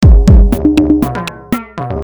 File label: Loop